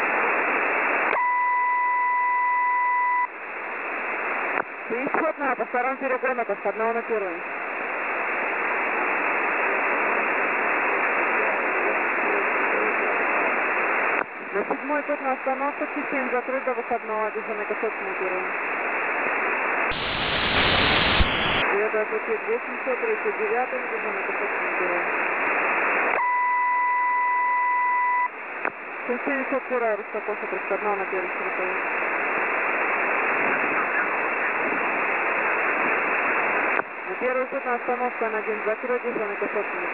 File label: Russian Railway, NFM; 2130khz; Russian Railway Dispatch